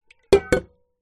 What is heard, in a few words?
household water in large metal pot down on wood; zapsplat